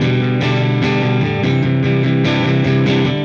Silos Dirty Strum Guitar, Indie Rock

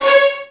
VN FX, Virtuoso Strings

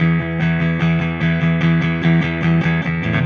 Silos Clean Strum Guitar, Indie Rock; Sonic Foundry (Sony Creative Software); Indie Rock Anthems; Session 11 - Silos

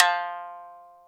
Shamisen F2; Planet Earth; Digital Sound Factory Planet Earth

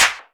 CLAP, DANCE

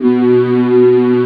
VOCODER; East West; Global Sounds

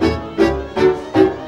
VIOLA GROOVE; Best Service; World Colours

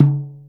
MALAY DRUM; Spectrasonics - Heart of Asia CD2

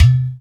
UDU, Africa; Roland LCDP14 Africa VOL-2; Afro Menu 1

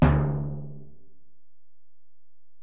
Bell, Alesis DM-PRO

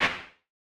CLAP, Vermona Mono15 Drums; blend with snare; Stereo layers

